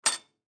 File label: Gumball Machine Door Close; Miscellaneous Door Sound Effects; FOLEY